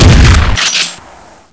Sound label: barrel; sven; weapons